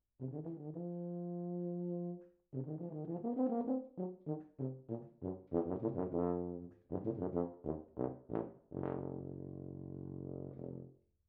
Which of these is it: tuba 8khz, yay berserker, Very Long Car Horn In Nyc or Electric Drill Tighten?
tuba 8khz